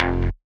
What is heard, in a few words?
Hardcore Bass; Big Fish Audio; Old Skool House; OSH Bass